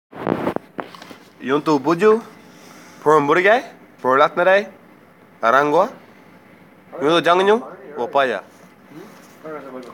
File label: Vocal